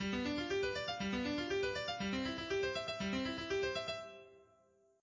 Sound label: arp up